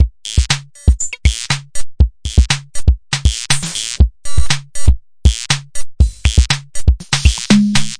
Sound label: dorky electro, 120 bpm, drum loops and breaks